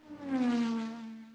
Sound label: crash, Millenium MPS-400